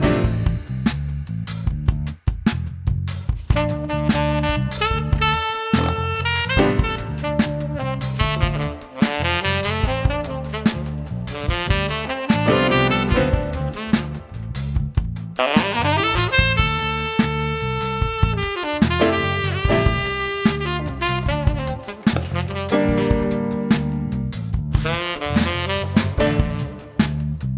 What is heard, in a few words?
IQ Series Limiter V2 MXD B clean; HOFA